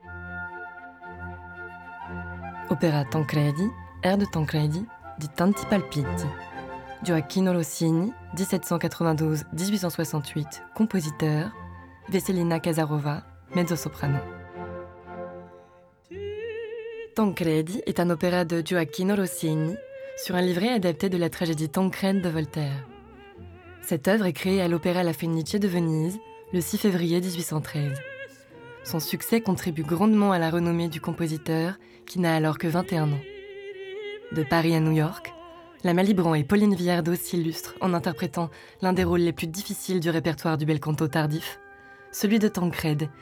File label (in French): Opera; Tancredi